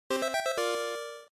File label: GB Racing Item